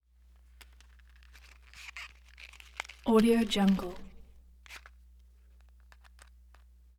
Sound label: wood matches